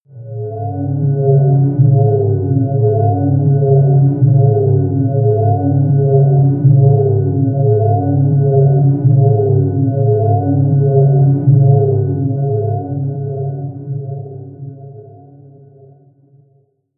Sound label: drone noise; musikelemente